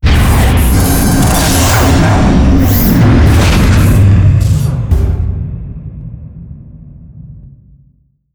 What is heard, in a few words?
juggle; wheatley; playonce level animation sfx; Portal 2